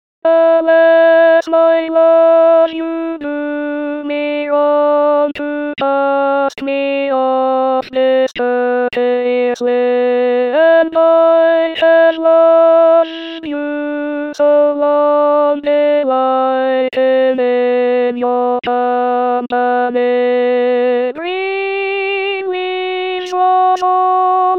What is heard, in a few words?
greensleeves alto